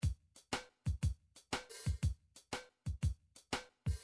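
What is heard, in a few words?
midi drums old country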